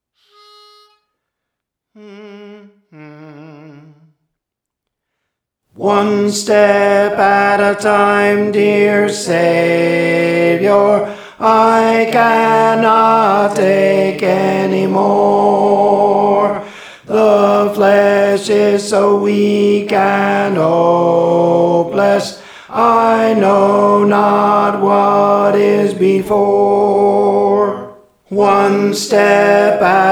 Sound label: Tenor, Praise For The Lord Training